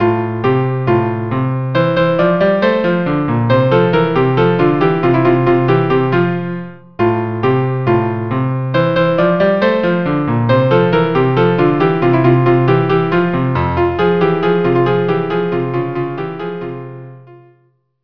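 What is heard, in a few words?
violin cello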